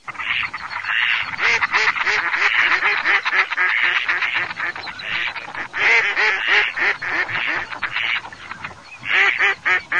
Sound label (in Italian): GERMANO